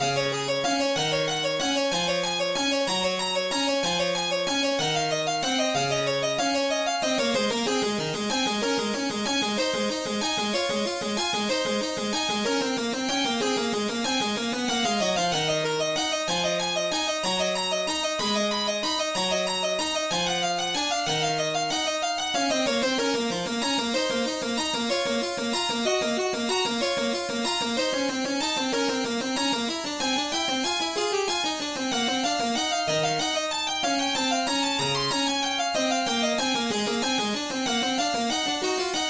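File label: No 3 in C Sharp Major, MIDI; BWV 848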